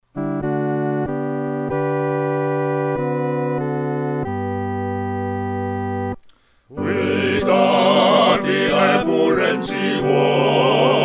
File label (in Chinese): 合唱 四声部